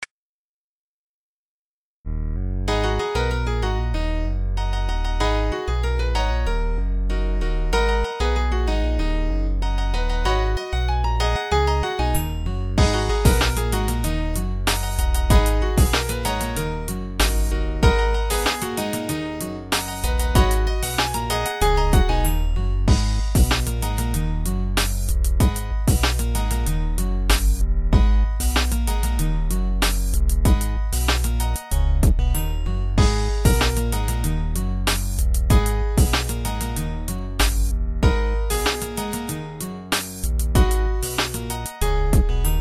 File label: Sweet Melody